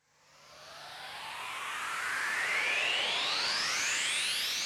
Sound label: 134BPM ReverseFx; Sound FX; Cmajor